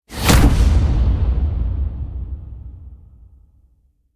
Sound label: Wave Hit Epic; Ninja Tracks